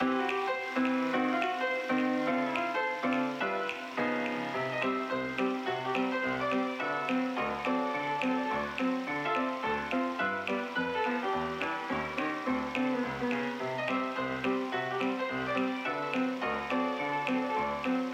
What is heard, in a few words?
(Piano); The Juggler